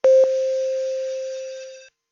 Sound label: Telephone